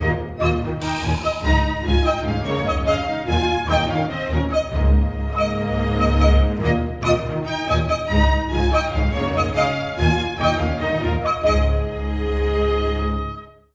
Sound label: frogs in a bog, orchestra